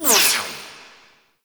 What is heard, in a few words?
Laser Fire Whiz By; Enemies; Weapons; Warframe; Tau; Sentient Dactolyst